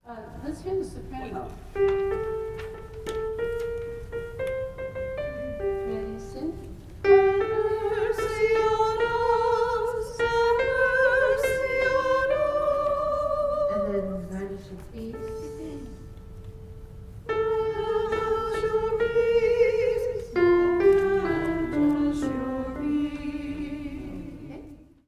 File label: Kyrie soprano